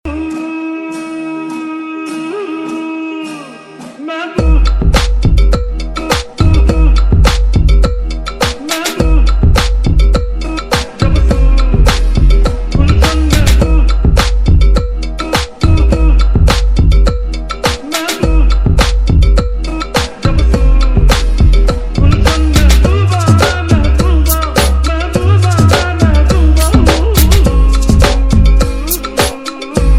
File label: Trending Remix